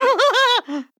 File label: Laugh; JONNY; Ed Edd n Eddy - Adromulus Pack; EEnE